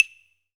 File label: Hi Block, Pecussion Hits; Zero-G Jungle Warfare 1, 2 & 3; 90 s Sample CDs